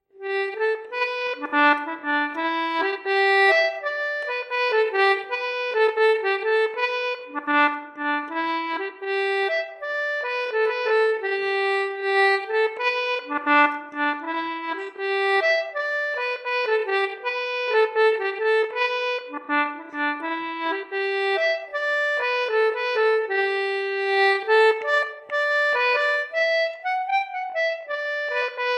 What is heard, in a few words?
Beginner Tempo